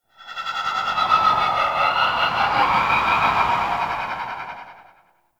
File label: Citrine Void Connector Shriek; Warframe; Citrine's Last Wish